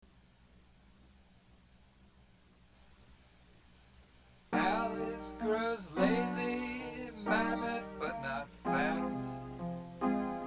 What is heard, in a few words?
chorus